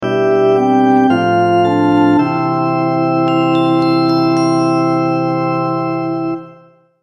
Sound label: jingle